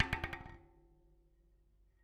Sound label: Spoke Bounce, Bicycle